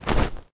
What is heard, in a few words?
fabric equip